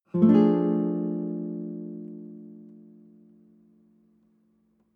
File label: nylon guitar chord high diminished soft